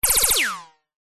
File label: multimedia game sound laser gun fire high pitched